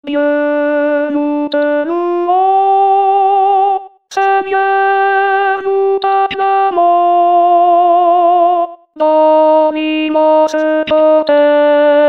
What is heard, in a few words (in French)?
Dieu nous te louons 4 voix